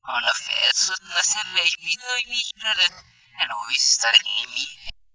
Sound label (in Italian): con orchestra